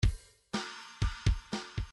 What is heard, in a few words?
bdf midi file grooves rock b rock b